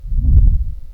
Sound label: WOOSH